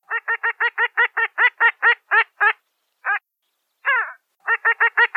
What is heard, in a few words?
grandule pin-tailed sandgrouse, birdsound